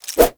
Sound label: Nunchaku Start Whoosh; Warframe; Tenno